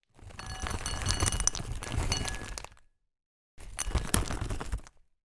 food peanuts pour from packet into ceramic bowl twice